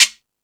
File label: Percussion, Drum Machines E-MU Pro-Cussion